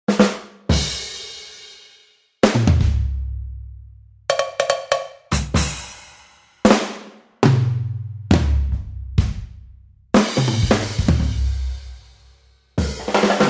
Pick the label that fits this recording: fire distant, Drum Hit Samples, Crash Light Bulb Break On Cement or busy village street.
Drum Hit Samples